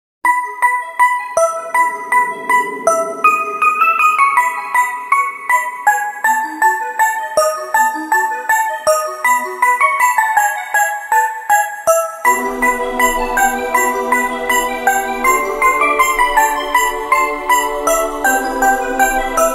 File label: main menu music; ice scream 8 update